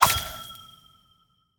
试炼刷怪笼生成物品声

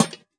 灯笼放置声

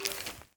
沼骸环境声; ambient

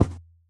骆驼脚步声

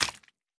蜂箱滴蜜声

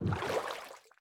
船在水中划桨声

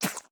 狐狸吐口水声

